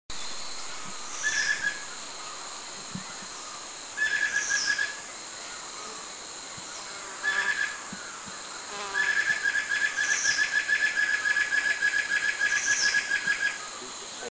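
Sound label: Greater Necklaced Laughingthrush; call; Eaglenest; India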